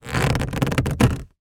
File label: foley plastic grip hand hard movements creaks; zapsplat